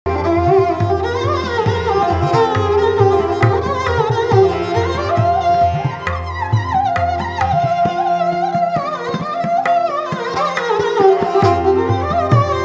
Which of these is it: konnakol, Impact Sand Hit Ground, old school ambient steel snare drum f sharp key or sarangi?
sarangi